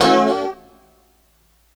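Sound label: G#3 A mono slide L, guitars; Spectrasonics; Hans Zimmer Guitars VOL-1; 90's Sample CDs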